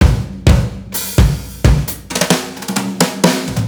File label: Brush C